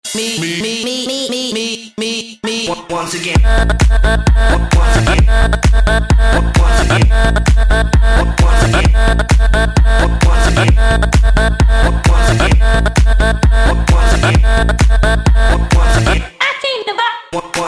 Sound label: House Music